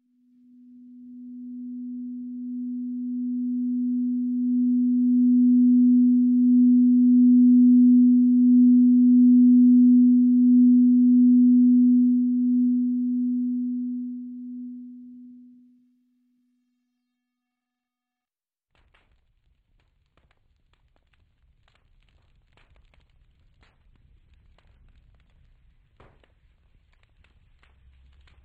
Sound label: Sine-Wave